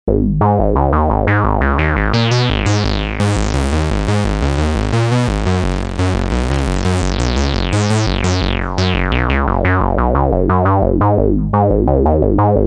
cs5 pulse

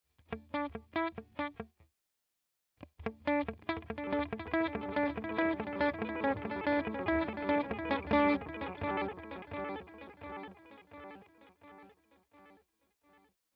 DelVerb Tape; UAD

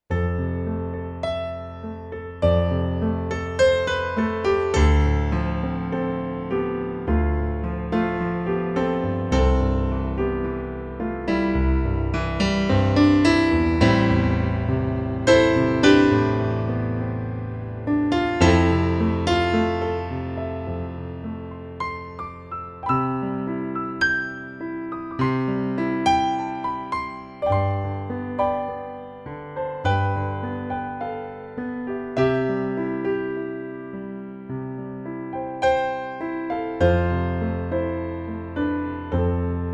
FP-50 Concert Grand

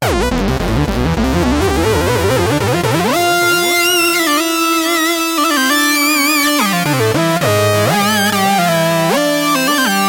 Solosynth

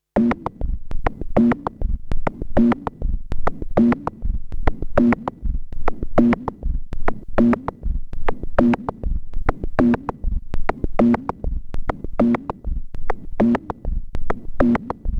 Behringer Edge

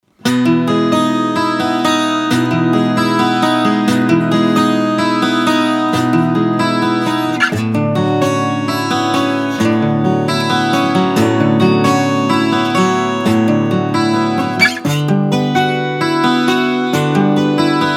12 string Line In EQ Shaped; Drawmer; Bounce Pan Mitte

